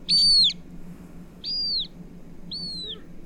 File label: northern harier